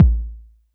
BD

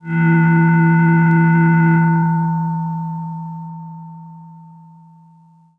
Breath V DX100 E2

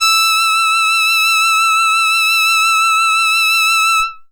Box Cello DX100 E6

